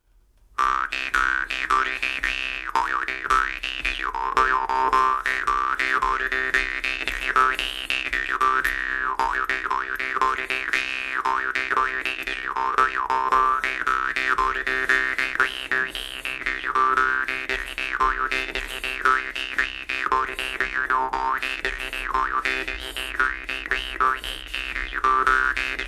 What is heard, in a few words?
humpty tune